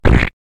squish fart; soundbible

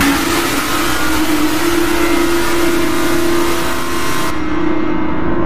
jumpscare

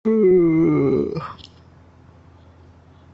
light yawns

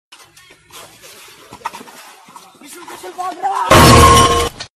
guy playing soccer runs into pole

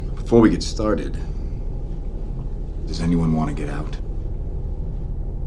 elevator fight louder

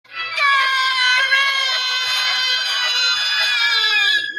shouts; pineapple house

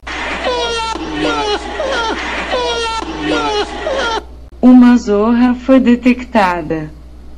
hahaha ha

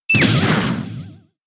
rock throw

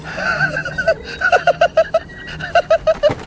laughin

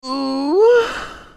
oooooooh

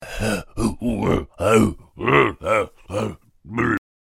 caveman vox

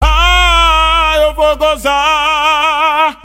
aaaaaaaaah

